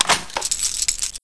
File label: m249 box in, weapons; czero opforce